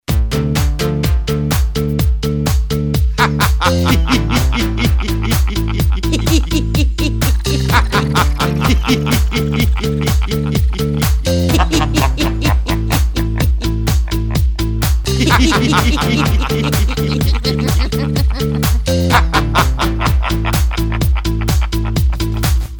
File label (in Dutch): ritmisch lachen